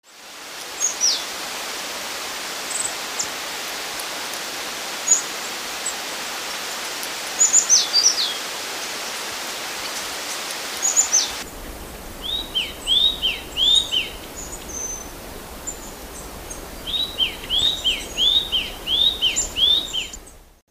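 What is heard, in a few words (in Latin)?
periparus ater melanolophus, Sikory; sosnówki rdzawoboczne; ptaki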